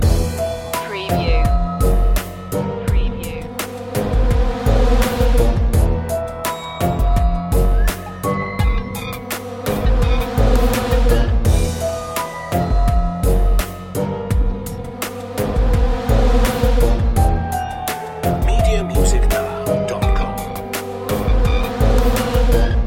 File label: zombie attack loop; Horror